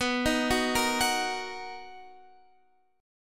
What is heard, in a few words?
B Major 7th B down; arpeggiated